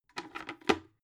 bucket handle use

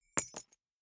key ring small drop carpet

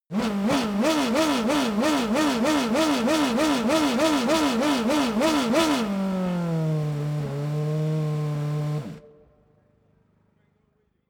racing car f1 engine rev sequence idle stop

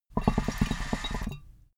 water pipe puff bubble short

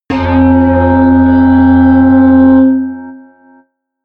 melodic pan hit

